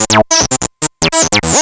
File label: ring